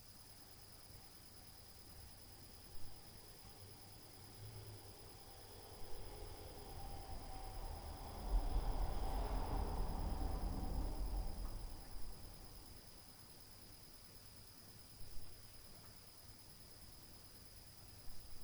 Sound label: Car Street Noise